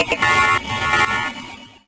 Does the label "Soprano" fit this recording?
No